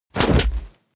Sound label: kick and drop